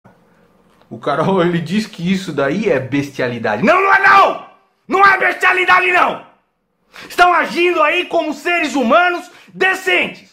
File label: ringtone; podia ser pior